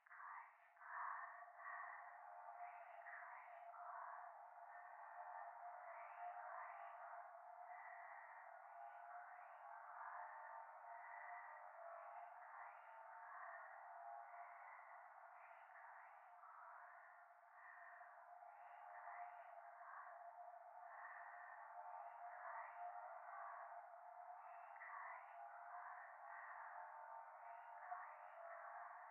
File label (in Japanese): しこしこ 車の中; 夢の中